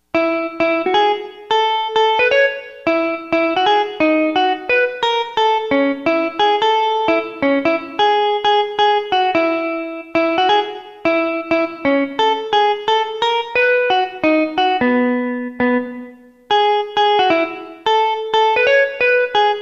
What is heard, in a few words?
country guitar melody